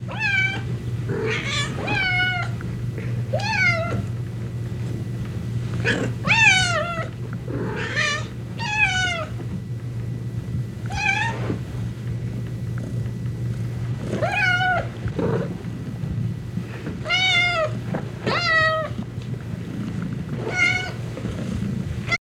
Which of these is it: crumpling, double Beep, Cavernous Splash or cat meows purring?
cat meows purring